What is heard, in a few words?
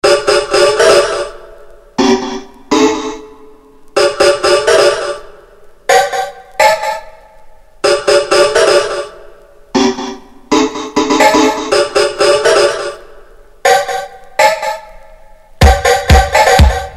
DISCO Emulator Dub